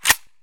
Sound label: saiga slider down; Escape from Tarkov